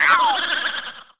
Mine die; Seer; GamigoNA

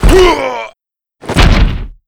Windy Giant Die

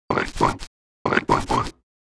Pig idle

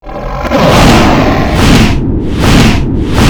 Dragon Atk Skil Casting

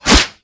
Attack; Gamigo

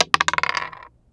Dice Roll Single

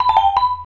Item Get

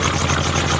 Steam Walk; Seer; GamigoNA

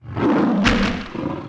Boar Die

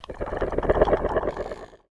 Lizard Man; Idle

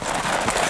Hungry Wolf Run; Seer; GamigoNA

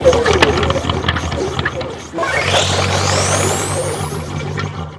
steam action

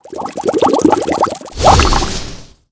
King Crab Skill

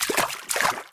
Turtle Sea Walk; Seer; GamigoNA

